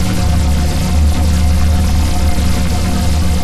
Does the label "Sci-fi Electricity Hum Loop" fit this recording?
Yes